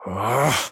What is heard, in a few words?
battle pain; dqxi; erik